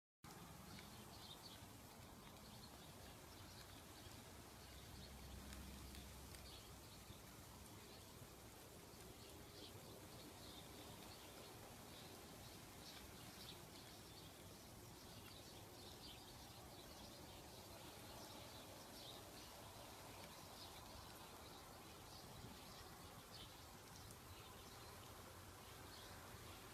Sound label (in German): Hund mit Lama oder Alpka